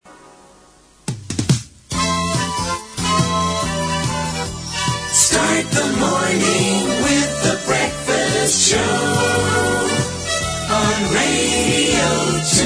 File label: jingle